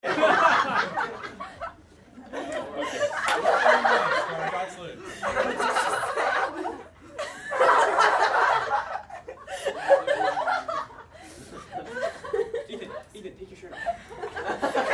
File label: Crowd Teens Girls Party Laugh Giggles; Crowds